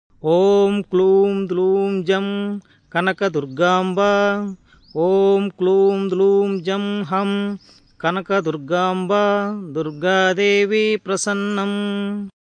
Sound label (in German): Wassermantra